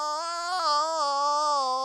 ugh; Truth-Hz - 1-Hit KO Sample Pack

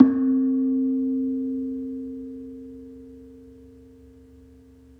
Bali Kenong C3, Mallets; TRUTH-HZ; Crowdsourced Sample Pack

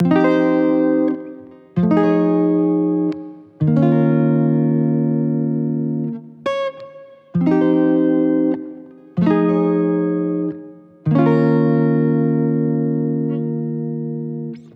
guitar chords; Crowdsourced Sample Pack; Crowdsourced Live Stems

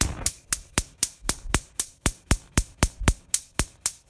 hi hat heater; Drum Kit; Hats; Crowdsourced Sample Pack

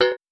doonk; Truth-Hz - 1-Hit KO Sample Pack

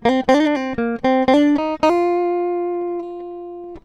guitar; TRUTH-HZ; Crowdsourced Sample Pack

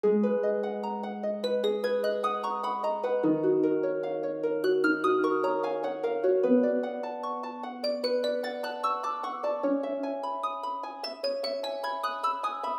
150bpm, Melody Loops; TRUTH-HZ; Crowdsourced Sample Pack

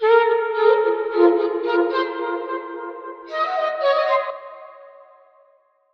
atmos flute 110bpm; Brass & Wind; Crowdsourced Sample Pack

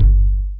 kick sub oneshot; Drum Kit Kicks

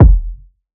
Mouldy Kick; Drum Kit Kicks

